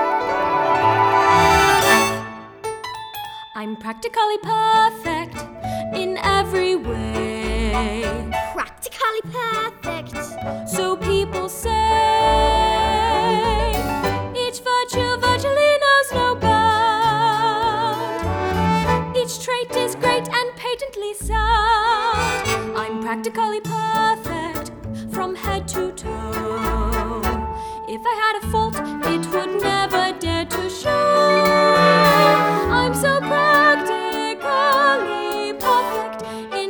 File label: Audition Cut with Vocals